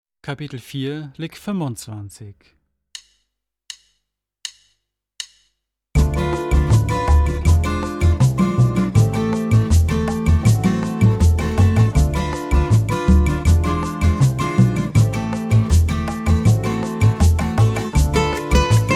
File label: Latin Style Guitar